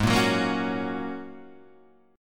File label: G sharp 7th Suspended 2nd Suspended 4th G sharp Guitar Half Step Down; G#7sus2sus4